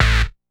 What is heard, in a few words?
Rip Bass; Big Fish Audio; Old Skool House; OSH Bass